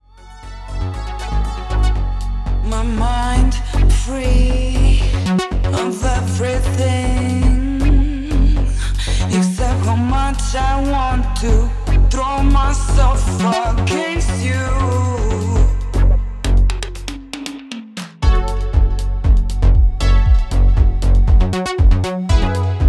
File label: Synth Wave; Le Corbeau d'Argent Production